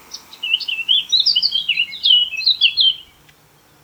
blackcap song; Blackcap (Kapturka)

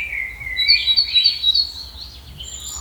great tit song

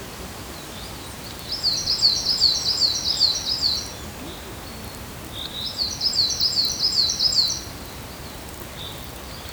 coal tit song; Two distinct songs from what I've noticed

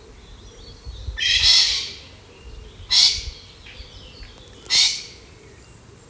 jay call; Jay (Sójka); Usually not very vocal

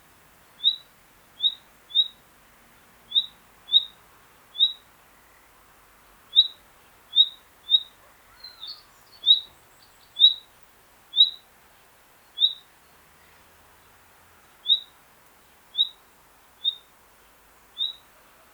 nightingale call; One time I faintly heard a nightingale at midnight, accompanied by a woman singing some kind of folk song

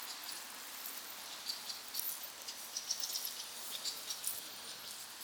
fieldfare call, Heard in the city.; Fieldfare (Kwiczoł)

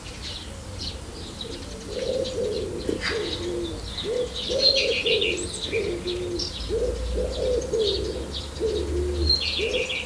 pigeon song; Pigeon (Grzywacz)

